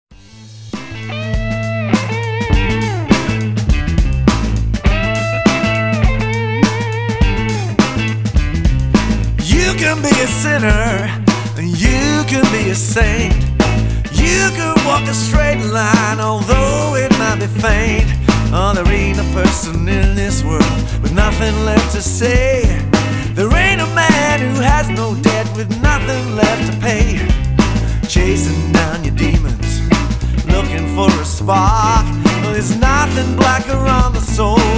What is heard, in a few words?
Country Dark